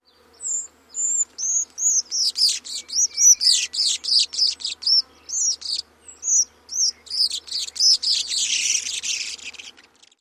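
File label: California Towhee calls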